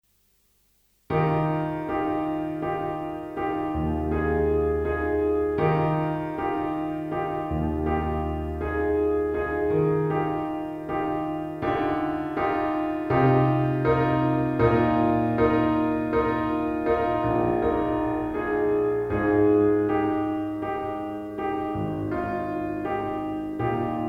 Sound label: piano pop